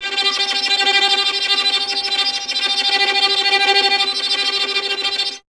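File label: string violin tremolo; Miroslav Vitous; Solo Instruments VOL-2; Solo lnst. II; Vln Trem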